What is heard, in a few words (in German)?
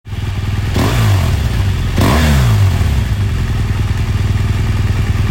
R1200GS ohne Schubakustik; BMW